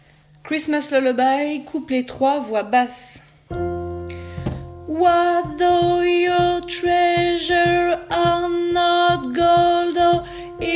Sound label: Christmas Couplet Basses